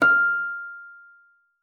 FLAG E6, harp; Xsample VOL-8; HARP+FX

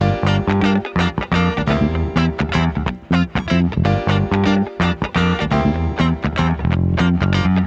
disco backing; Best Service ProSamples vol.48 - Disco Fever; AKAI

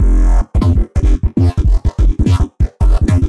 Neuro Bass Loop Quick

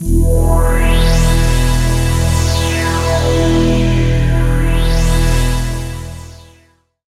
Pads; Statement; Jungle

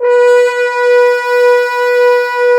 F HORNS, BRASS; AKAI S-Series CD-ROM Sound Library VOL-8

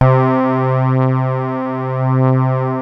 K3 strings, Kawai; Rubber Chicken Software Sample Bank

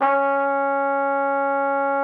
TROP C#4, TRUMPET; Xsample VOL-3

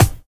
HIP DNC K; Drums In Your FACE!; BONUSKIK

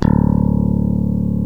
FACTOR PIC D, bass; Greytsounds

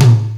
TOM, TOMS KIT, Ragga & Dub Reggae

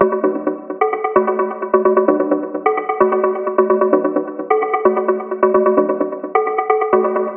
130 Perc Log Drums Dmaj; Percussion; Zero-G; Future Wave

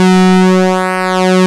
PULSE MOD, Prophet Five; E-MU Formula 4000 Series Vol. 3; Analog Odyssey

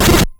Die

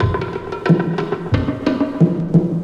Beat, Drums, Eclectic Electronica

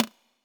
Toms, Elektron MachineDrum SPS1 MKII

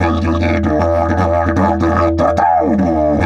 150, DIDGE RIFFS

